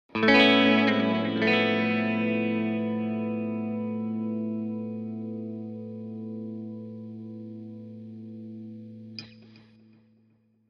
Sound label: Elect Guitar, electric guitar